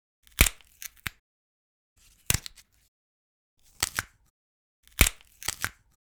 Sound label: Breaking Wood